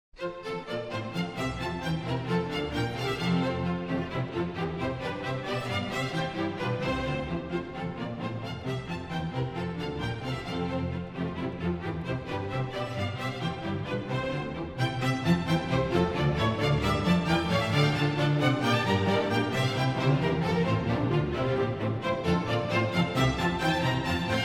Water Music Suite No. 1 in F major - Bourree. Presto; HANDEL, Georg Frideric; The Water Music Suites